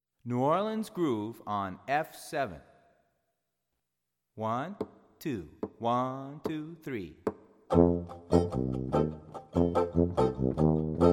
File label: F7 New Orleans Street Groove